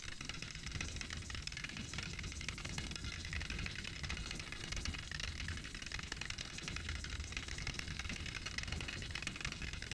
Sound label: a biomechanical fractal, biomechanical